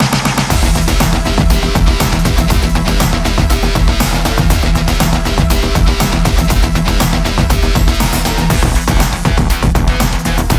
Boomstick Rhythm; Diamond Mine Productions

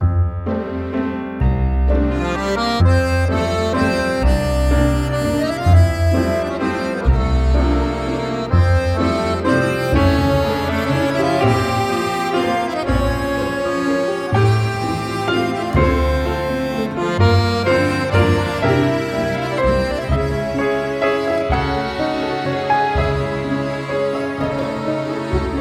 French Film

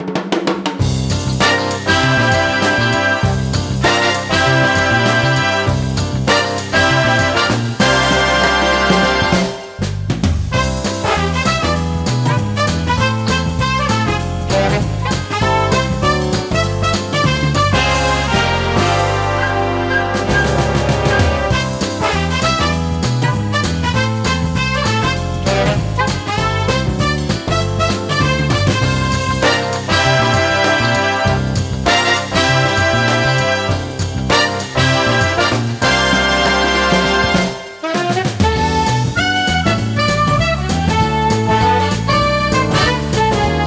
197B

